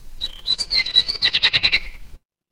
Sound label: animal bird